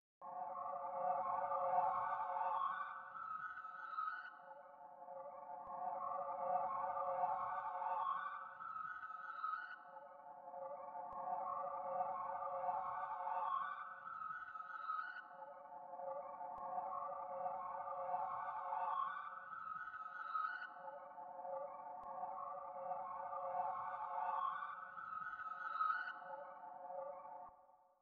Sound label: 88 BPM B Min Synths; Melody; Loop Stems; Cymatics; 2022 Melody Collection Platinum Expansion - Pt 1; Flashbang